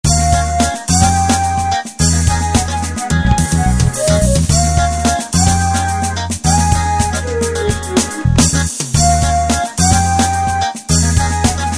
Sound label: lyrical jazz